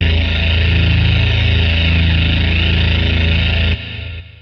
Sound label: monster distortion bass (A); Sonic Foundry (Sony Creative Software); Ugly Remnants VOL-2